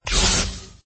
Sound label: controlled shock